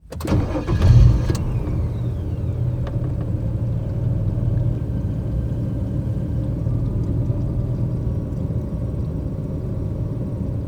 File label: Van Minivan Start Up Idle Shut Off; transportation